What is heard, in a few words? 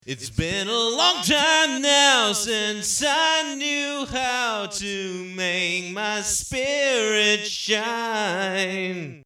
rockabilly